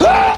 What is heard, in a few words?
"wooahh"; ooh ahh; ho hoo woo